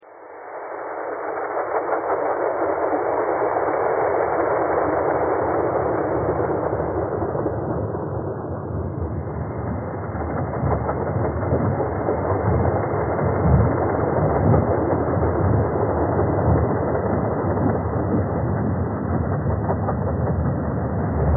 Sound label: train thread all